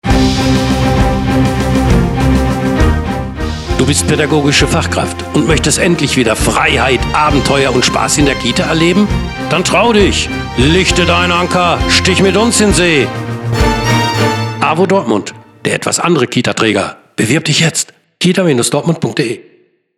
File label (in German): Jingle; AWO Kita ohne Kind; stich mit uns in see